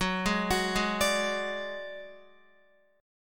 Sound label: F sharp Suspended 2nd Sharp 5th, Guitar; arpeggiated; JGuitar